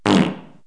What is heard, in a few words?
Passing Lots of Wind; KABOOM! - Wild and Wacky Sound Software; Human